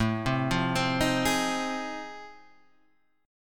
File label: F 7th Sharp 9th